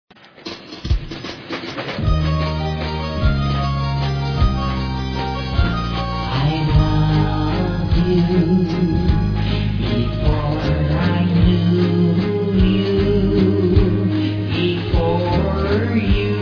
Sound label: Waltz